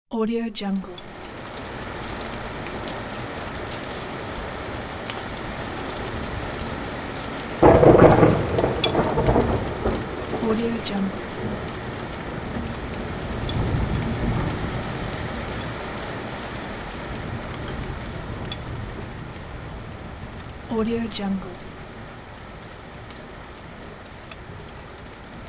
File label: small summer storm and rain